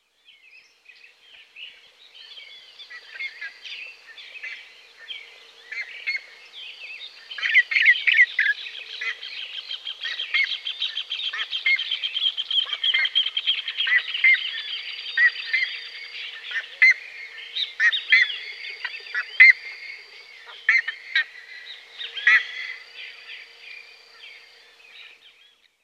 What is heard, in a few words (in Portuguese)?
curicaca; fauna